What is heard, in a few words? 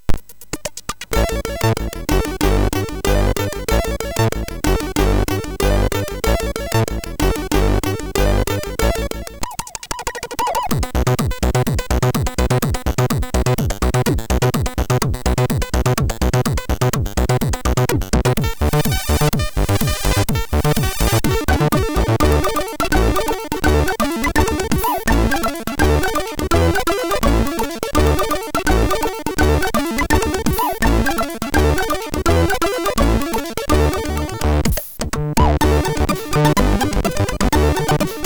Crazy Mixture, sid MOS6581R3; hvsc